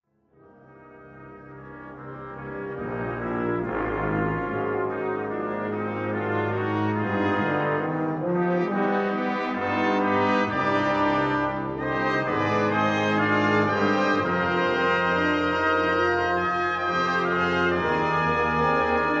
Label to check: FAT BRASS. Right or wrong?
Right